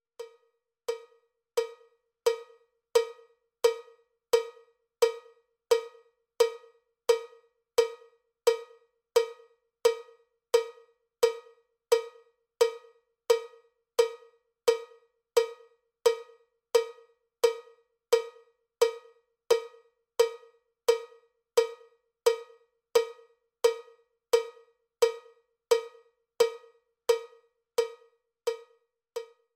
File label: cowbell click track bpm 79; cowbell click track bpm 87